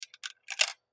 Slot Machine Coin, Slot Machine Put Coin In Antique; Mechanical Casino; Slot Machines; SPORTS; Blastwave